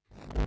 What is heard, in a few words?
Teleport Power-Off Rev